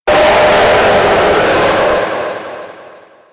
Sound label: the screech; used by most of the characters